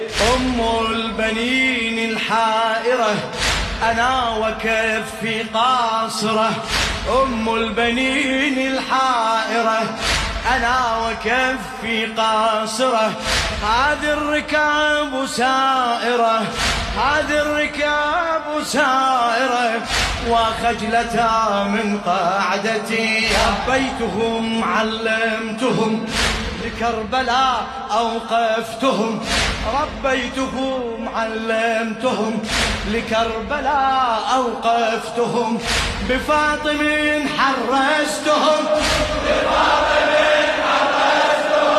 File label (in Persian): مداحی عربی